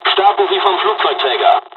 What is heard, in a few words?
sample takeoff